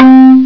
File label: TONK